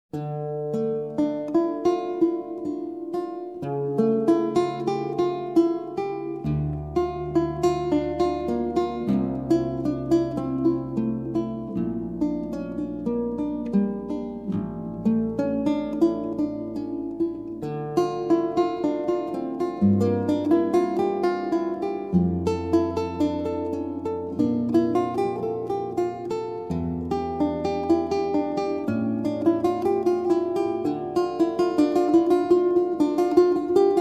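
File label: prelude baroque lute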